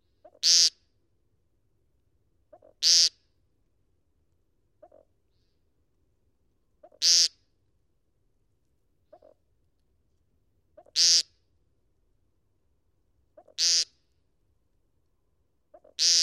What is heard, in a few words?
american woodcock peents and throaty